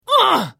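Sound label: Hit, Khajiit female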